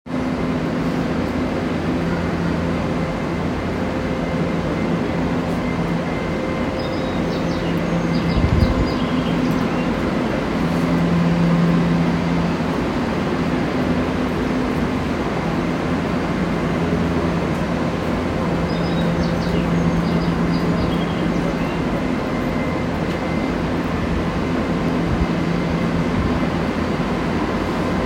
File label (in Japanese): 小田急線海老名西接近